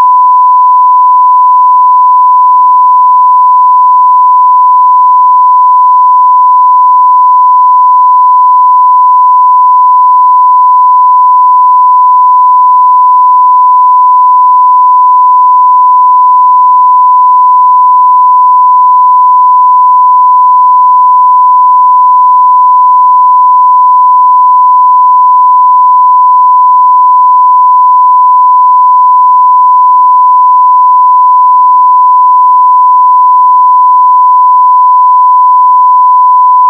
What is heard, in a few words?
1k Tone 0DBFS